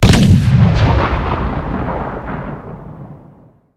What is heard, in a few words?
art cannon shot far